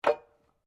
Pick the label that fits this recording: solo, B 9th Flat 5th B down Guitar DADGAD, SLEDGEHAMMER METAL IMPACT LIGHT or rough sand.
SLEDGEHAMMER METAL IMPACT LIGHT